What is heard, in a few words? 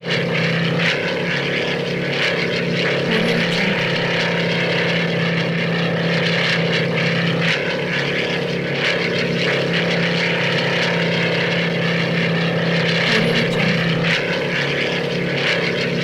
race car constant motor buzz; prosoundfx